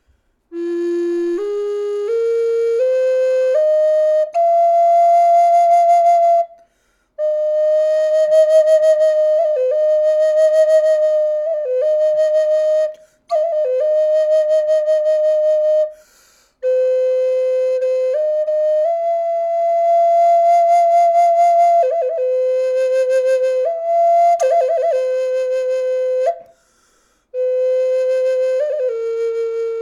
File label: natural, Flute